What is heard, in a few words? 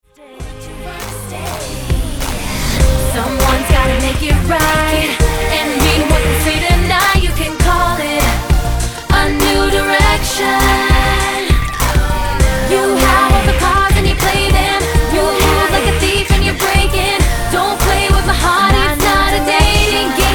Pop